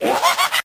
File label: laugh witch